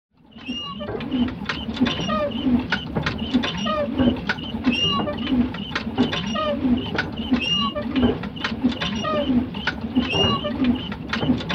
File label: windmill creak